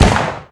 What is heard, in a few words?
heavy ammo explode; EK modern; Barotrauma